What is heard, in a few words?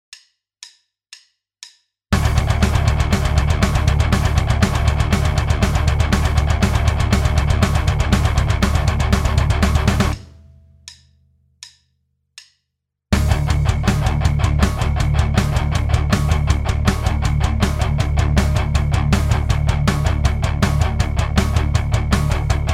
rhythm